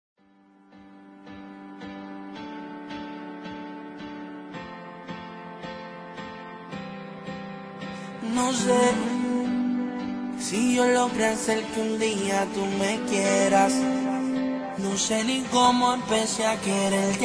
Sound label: romantic latin